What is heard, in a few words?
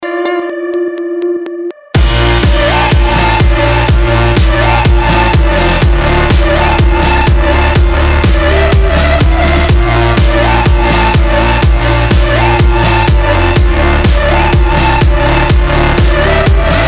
space house music